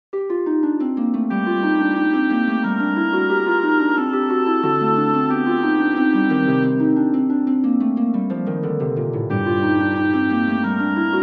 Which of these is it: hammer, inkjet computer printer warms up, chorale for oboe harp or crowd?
chorale for oboe harp